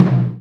LARGE DRUM, JAPANESE PERCUSSION; AKAI S6000 CD-ROM - Volume 5; Ethic

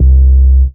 DM BAS, Dance Mega Synth

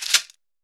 CABASA; AKAI S-Series CD-ROM Sound Library VOL-5

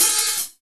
HH, Drum Mashines; EdgeSounds

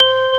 ST ORG C5, ORGANS, House Side Of 2 Step; USB Soundscan vol.51; AKAI

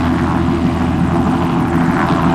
COPTER LO, Helicopter; SFX

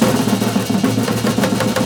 A#3, JAPANI PERC; Northstar; The Wizard VOL-1

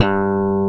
G2 bass

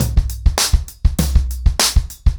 100BPM, ACOUSTIC DRUMS

